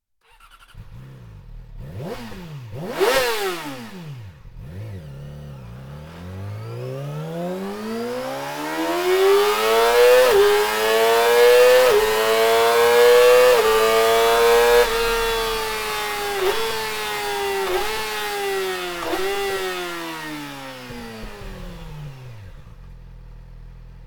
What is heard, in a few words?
slip on wo insert